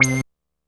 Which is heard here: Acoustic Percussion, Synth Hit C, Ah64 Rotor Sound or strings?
Synth Hit C